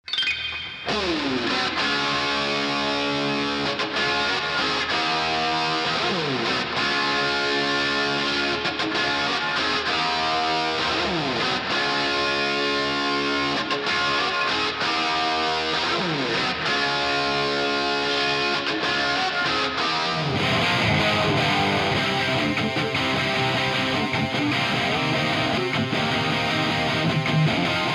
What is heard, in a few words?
guitar stems